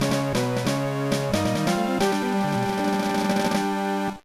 Unknown Jingle; crazy chase; gba

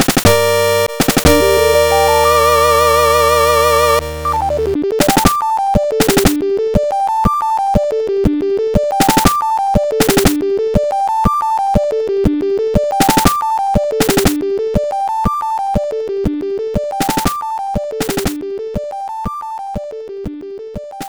Victory (Classic); The Dark Spire

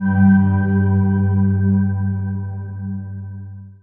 sting box close; monument valley

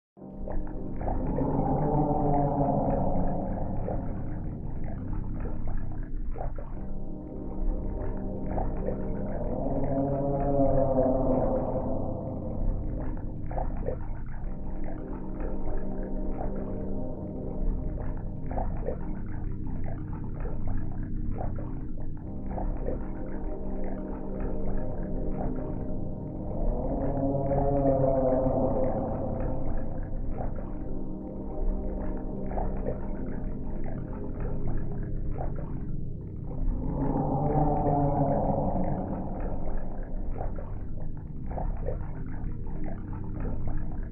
podklad underwater

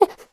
thinking; Scrat

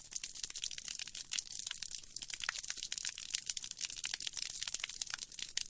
Blood Gushing

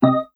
Rhythm Whoops; super crazy rhythm castle

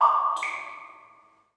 Splat

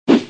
swing; gamerip; chibi knight

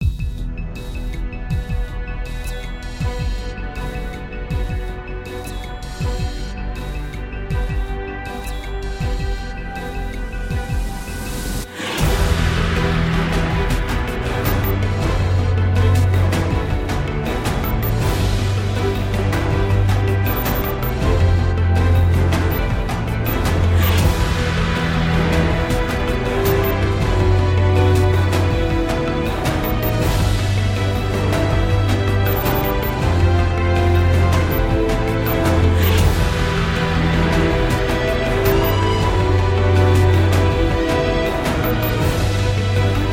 bgm battle; world brave; seven battle middle normal

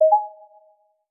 Game Won S F X

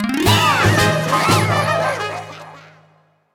end of round stinger plant win

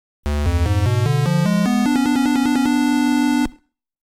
Game Start; Game Sound Museum Famicom; Donkey Kong Jr

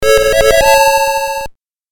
Small Victory - Item Get!; madou monogatari i mittsu no madoukyuu

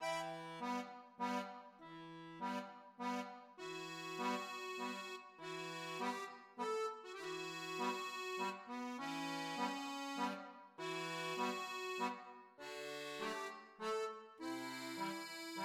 Accordion; Dirtmouth

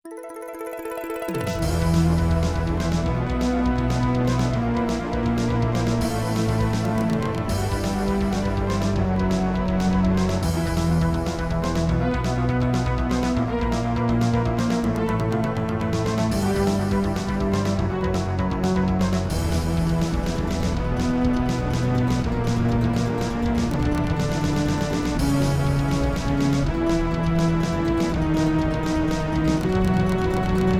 MT-32 Version; Beat; Granada; X68000

